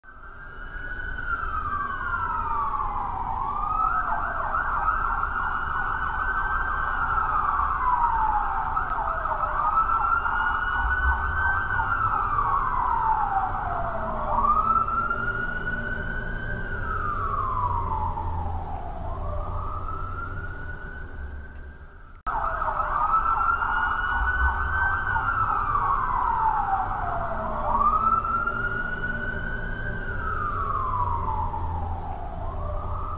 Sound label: Police Siren Ambience in Busy City